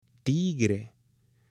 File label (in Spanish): TIGRE, ANIMALES; AppLenguaje